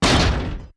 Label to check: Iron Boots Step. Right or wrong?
Right